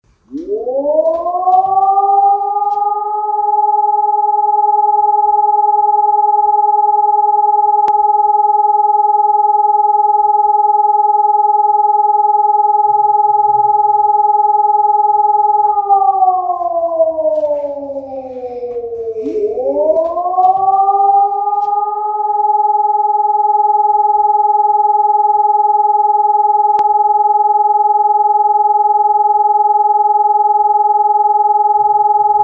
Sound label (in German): sirenensignal alarmierung